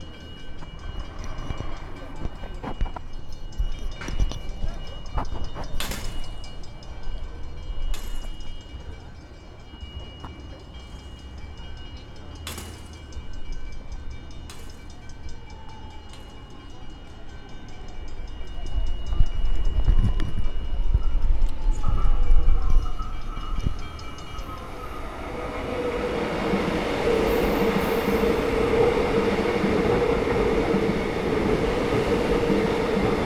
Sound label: Train Station Small; guidedogs